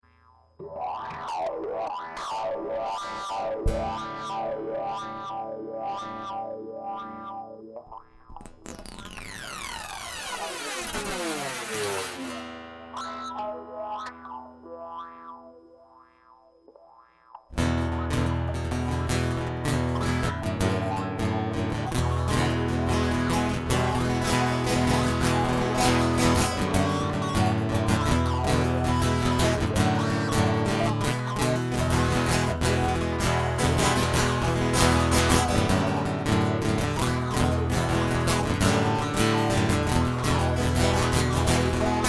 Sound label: Thunder Electric Guitar Instrumental; hyper terrestrial; Charons Obol